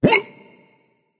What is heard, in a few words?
surprised, voice; Smite; ymir skin14